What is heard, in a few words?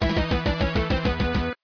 failed; asciisec